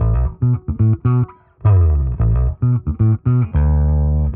bass funky feel, Loops, Phonky

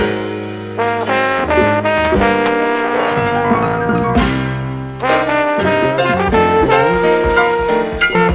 horns, Phonky loops

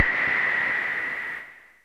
submarine, zaps and beeps

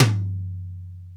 eswift, Toms; Real

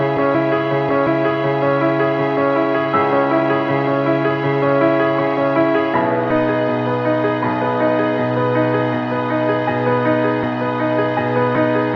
piano, Loops Sad

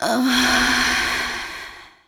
female orgasm

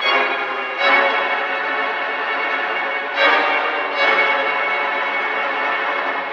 strings, Sad Loops

